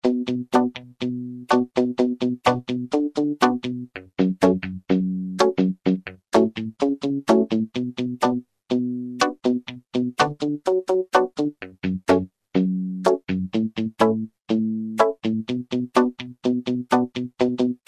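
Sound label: MUTE GUITAR, Kurzweil K2000; Kurzweil K2000 Contemporary ROM; Contemporary ROM Programs and Demos diskette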